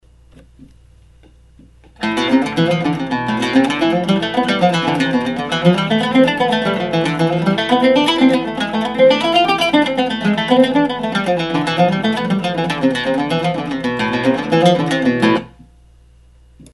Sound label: kapris exercise, oud